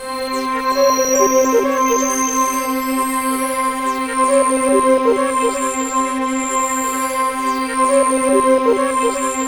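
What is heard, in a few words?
slow motion C5, ethereal atmospheres; Soundscan - Ethereal Atmospheres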